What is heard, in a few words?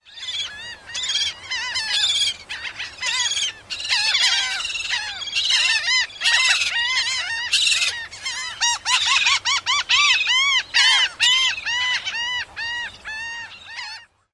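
calls of young at colony, bird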